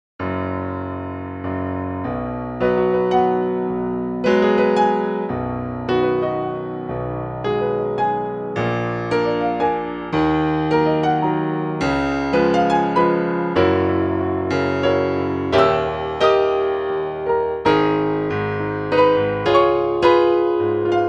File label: Mattinata Piano Track; audition material; The Young Man